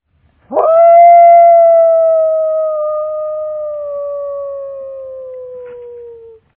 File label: Coyote